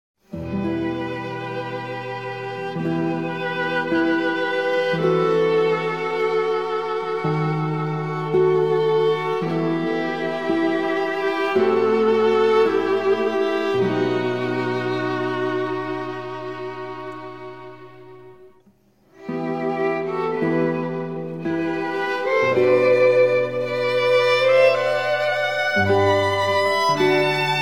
Trio Sonata in E minor Grave

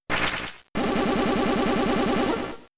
Hyper Beam; Pokemon; Gen 3; attack moves; RSE

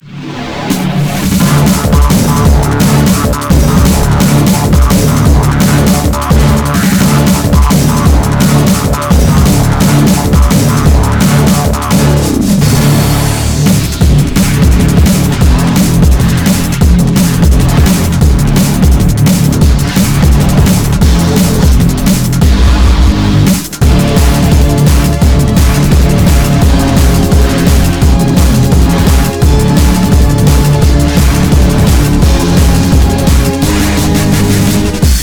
Boss Aggressive; Centipede: Infestation; Nintendo 3DS

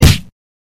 Jab; Afterbern Democralypse; Windows

